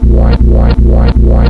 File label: oscillating; Sound Effects for Multimedia - Volume 1